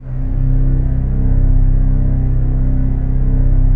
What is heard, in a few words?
PAD; Best Service; XX Large; Pads